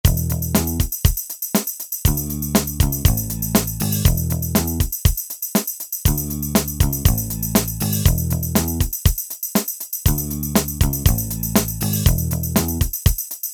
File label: Pop